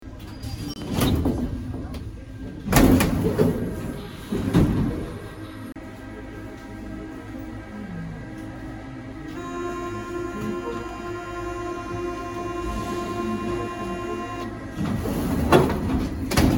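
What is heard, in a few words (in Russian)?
dveri parizhsk metro i ezda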